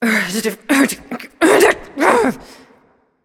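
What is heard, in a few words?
grumble, female; smite; voicepack; hera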